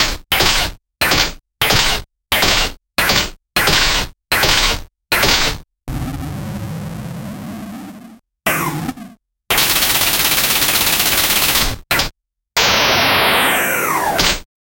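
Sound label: a pixelated chiptune sword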